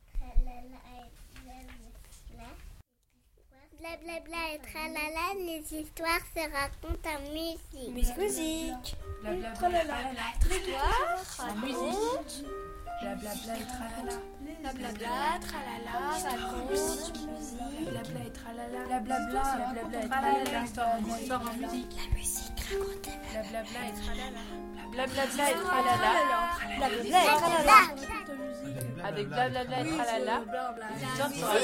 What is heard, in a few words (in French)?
mix enfants